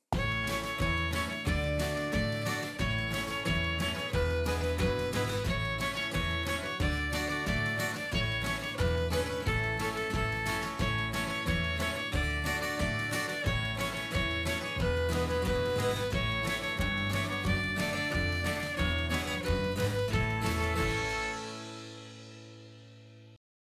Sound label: Violin